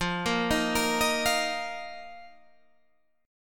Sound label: D sharp Suspended 2nd, F